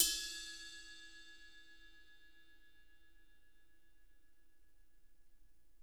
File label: ride bell, Drum Kits; Sonic Reality